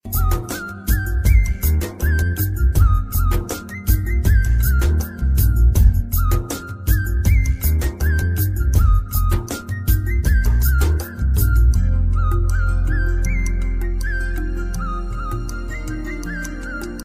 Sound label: melodic tone ringtone